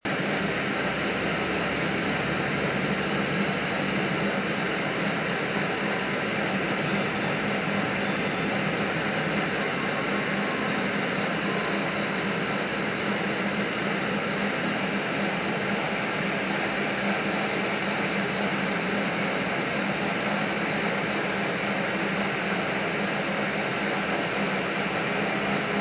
bus aircon white noise

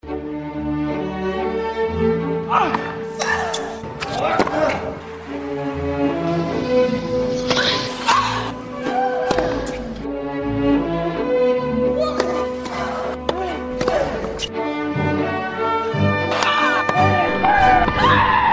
grunt like the pro s

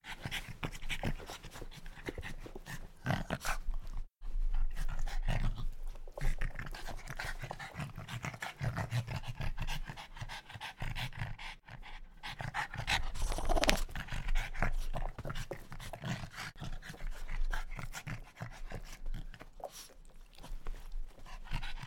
snorting dog; oregon

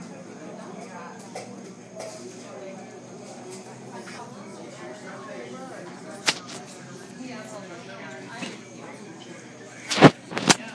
a minute and a half of ambient noise

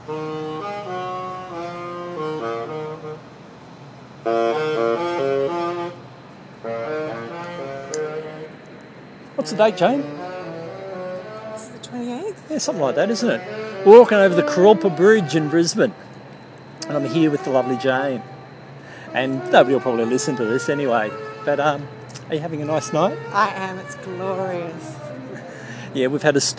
sax on a summers evening

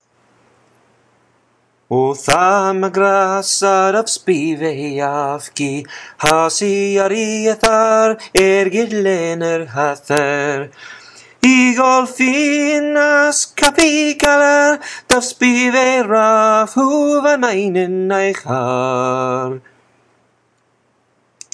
a little celtic tune

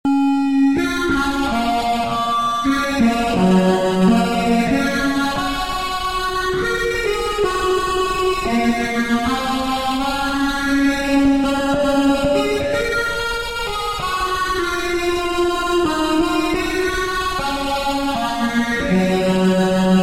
american anthem

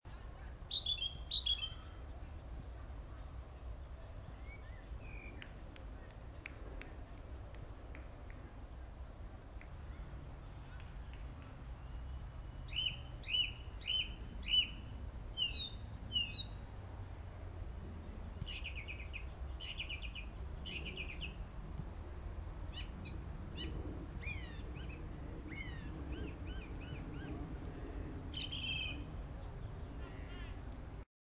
the sound of tooting bec common

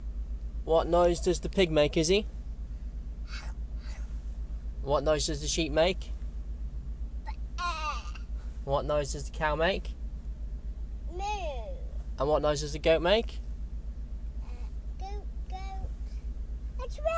animal noises

